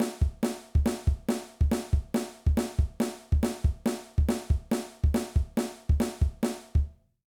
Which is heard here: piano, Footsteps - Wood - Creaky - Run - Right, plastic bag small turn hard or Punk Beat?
Punk Beat